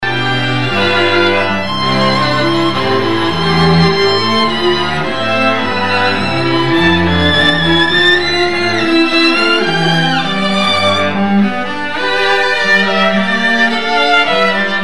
String Quartet First Violin; classical violin; Borodin; Hayes String Quartet

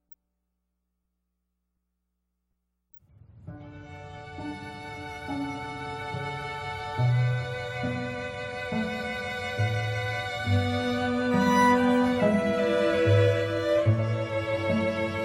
String Quartet; Bach Air from Suite 3 in D Major; Classical; Heritage String Quartet

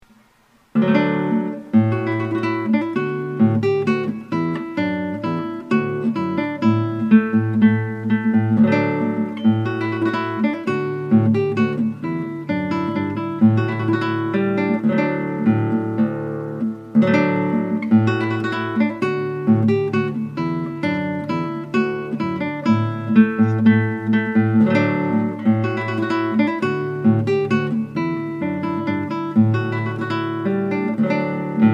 Trumpet Tune, Acoustic Guitar; Dustin Jones

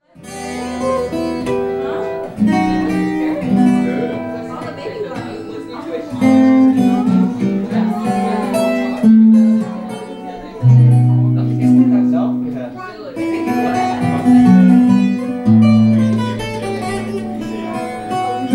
Classical, Guitar

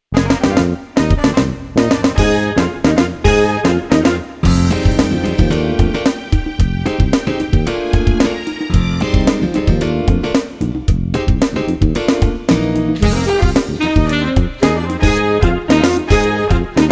saxophone

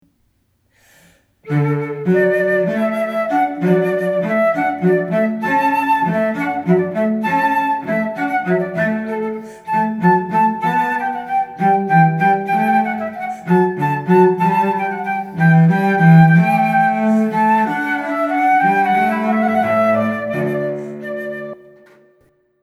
Hornpipe 2 from Water Music flute and cello; by Handel; Christen Stephens

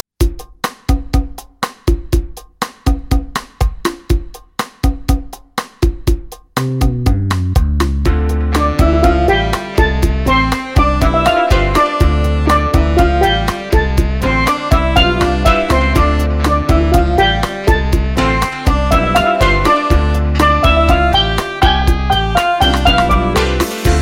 instrumental, steeldrum

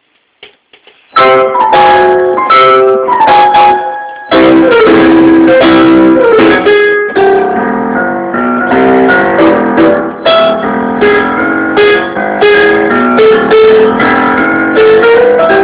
jazz piano; Elder Entertainment

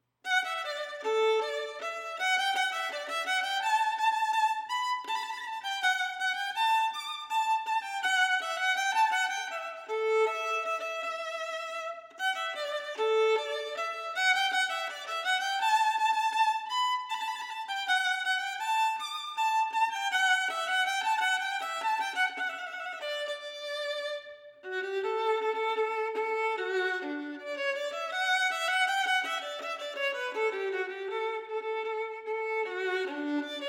Violin - Rondeau; Classical; David Turner Music Ensembles

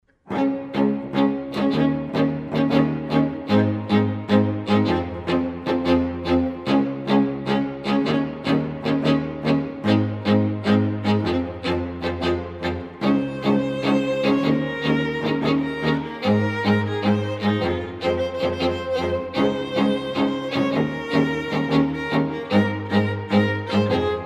string quartet

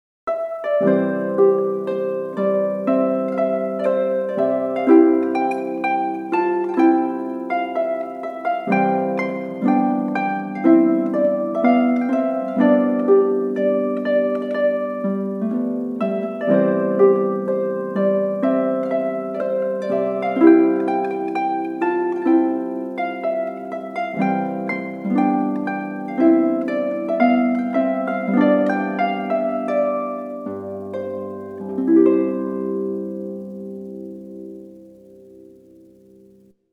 Rondeau solo harp

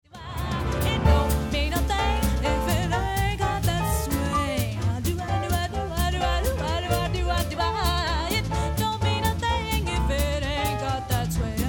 Jazz

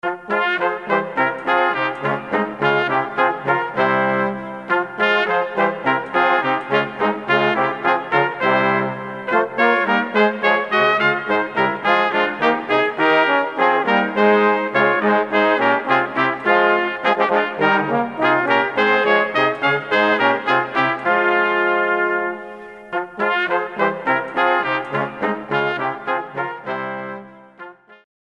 quartet, Brass; God Rest Ye Merry Gentlemen

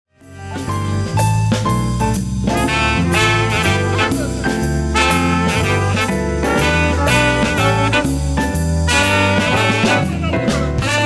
small group, big band

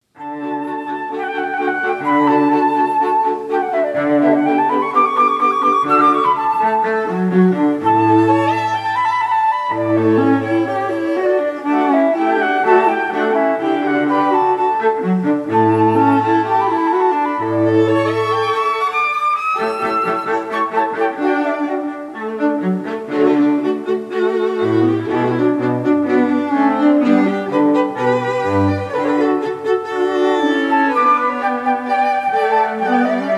Flute And String Quartet

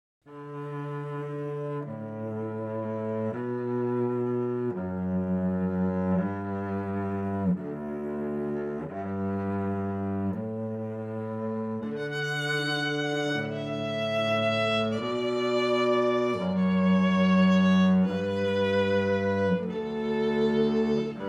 Kanon in D, String Quartet

